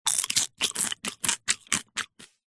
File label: human eating crunching cereal cornflake; zapsplat